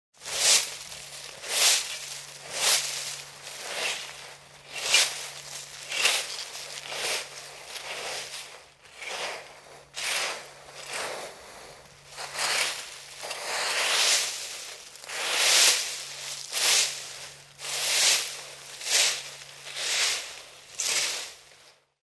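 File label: broom and leaves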